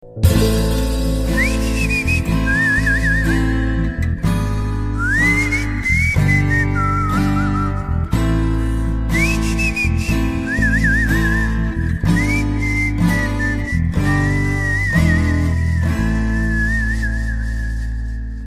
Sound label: whistle; Jigarthanda